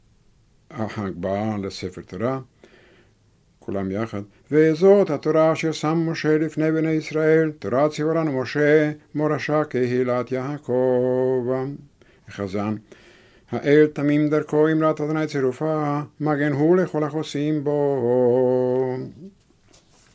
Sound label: Vezot hatora; Kippur Shaharit